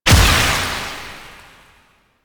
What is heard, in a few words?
Projectile Death Tech Medium; Duviri; Incarnon Adapters